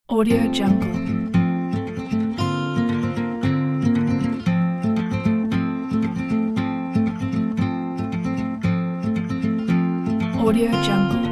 inspirational warm guitar sounds

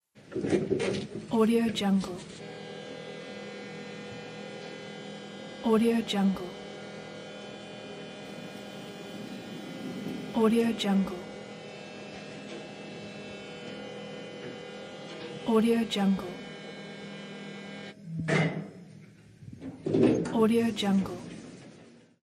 old elevator

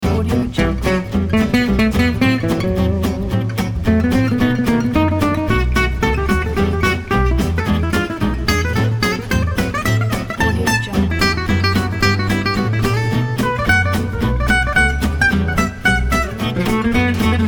jazz manouche guitar

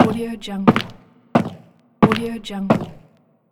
steps

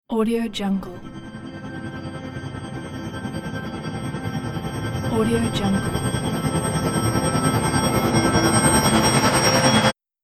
time spell of magic

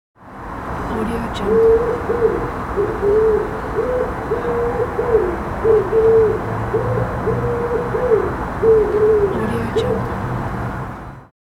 wood pigeon call

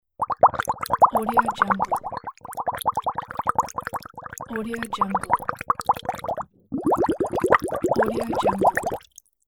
water bubbles

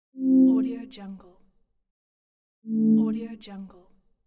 menu interface sounds

